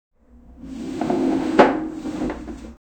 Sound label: moving on sofa